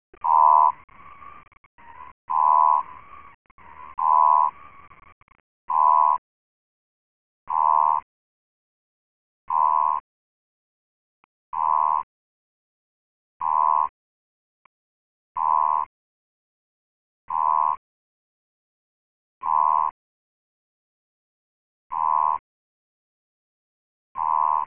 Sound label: Advertisement call Odontophrynus americanus